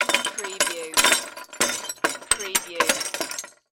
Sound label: Debris Ceramic Fall